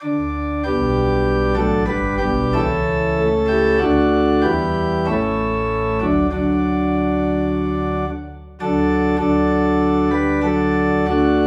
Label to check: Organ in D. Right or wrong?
Right